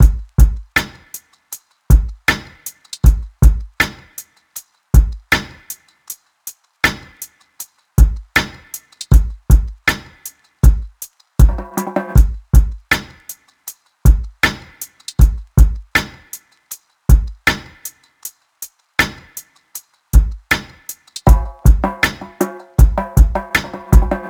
drums 79, Full Drum Loops; Slate; SEISMIC Drum Sample Pack

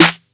snare slurp; one shots; SEISMIC Drum Sample Pack; Slate

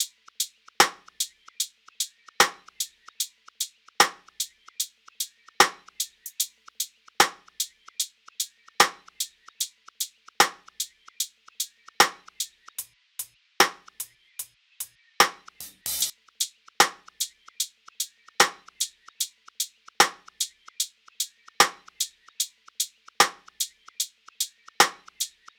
tops 75, Top Loops; Drum Sample Pack; SD SEISMIC; Slate

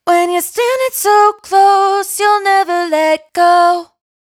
hook 128 Fm, Dry Vocals; Raw Vocals; Close; Slate Digital Omnivox Vocal Sample Pack

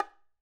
bongos Single Hit Finger, Raw Perc One Shots; SEISMIC Drum Sample Pack; Slate

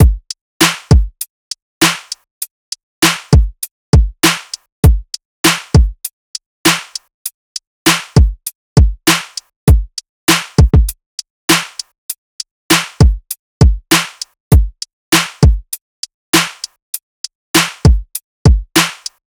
drums 100, Full Drum Loops; drum sample pack; SEISMIC; Slate

